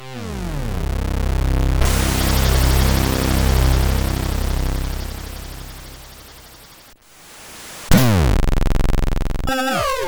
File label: Happy 50th Birthday Rock sid MOS6581R3